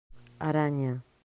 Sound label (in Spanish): arana